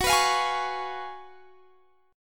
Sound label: F sharp Major 7th Suspended 2nd Suspended 4th, Guitar, Standard; F#M7sus2sus4 strummed